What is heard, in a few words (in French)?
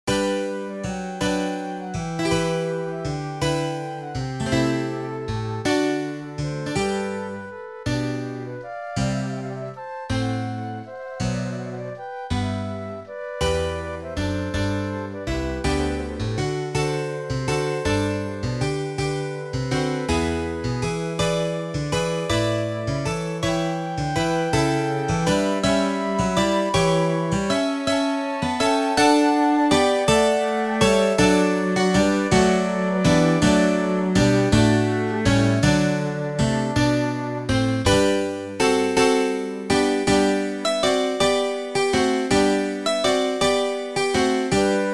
Sinfonia Oratorio Noël; da Camera